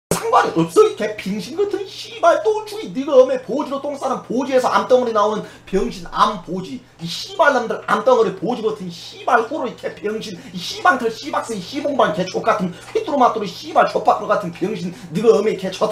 scout positive vocalization

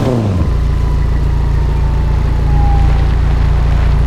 revdown; jopolestar; nfs heat ganimator pack

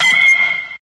swing hit; hoseong

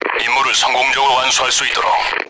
start action; music; sudden attack; tfgo

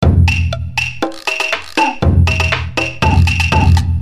action in silence, music minigame; tf2ware ultimate

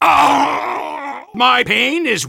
soldier pain severe

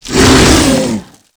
shout; silent hill

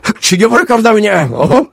engineer laugh happy

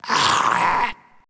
gorefast hurt; kf2; gorefiend; slender

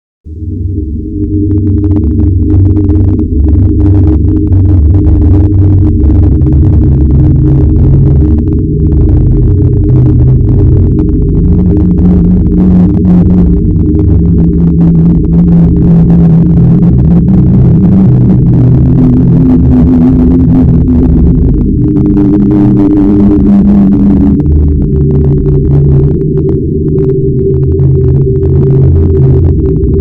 idle theme; slender; zerosiki

